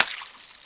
Hit; wizard

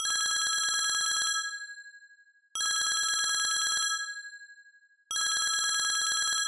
ring

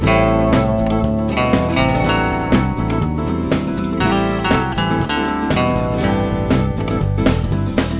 loop

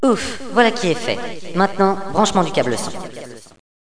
kit; Villagina; ecole

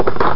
throw; Hottest 6 (1996)(PDSoft)

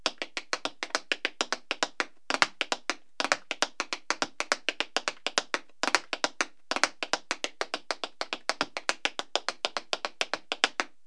Tap Dance; Music

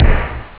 drums snare; OctaMED SoundStudio V1

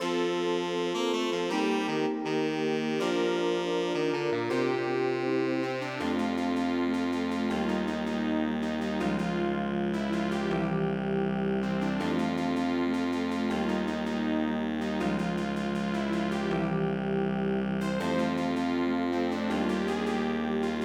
sci-fi

